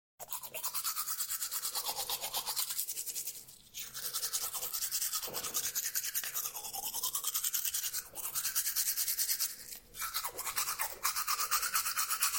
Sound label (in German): Zaehneputzen